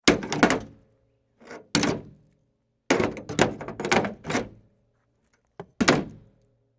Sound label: hooking up car to tow truck